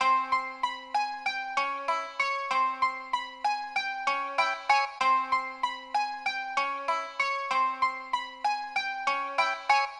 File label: Baglamas, 096 Cmin